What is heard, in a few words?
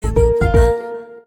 Message Alert; OEBB